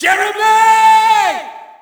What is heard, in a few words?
Shout, audience; UFC Tapout 2